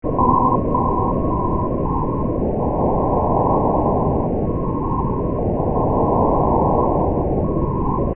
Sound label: siren